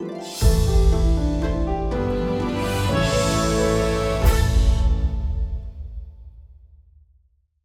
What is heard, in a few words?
Skill Fanfare; Glitch Hero; Orvis Soundtrack - Skill Fanfare B